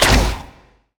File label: Crossbow Ice Cast; bullet